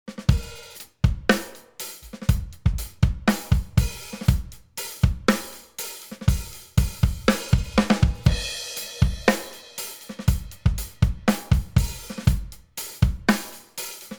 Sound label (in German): SPL Iron v2 Drums Kompressor ohne SC EQ